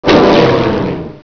jump exp; asciisec

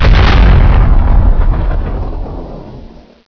c explode; asciisec

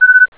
Missile Lock; GLScene; AirBlast

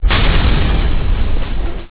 Big Impact; GLScene; AirBlast

